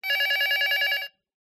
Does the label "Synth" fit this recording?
No